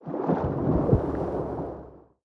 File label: escape, fishing